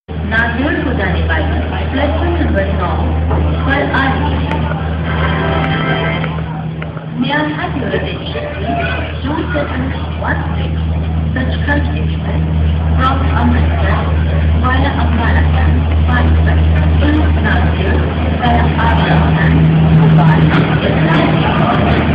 Railway

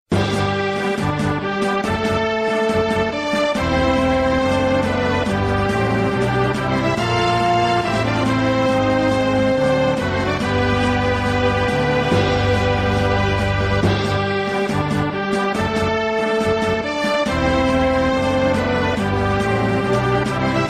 Theme; Locke

